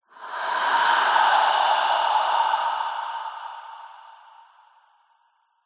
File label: breath exhale sigh reverb descend then ascend eerie; skyclad sound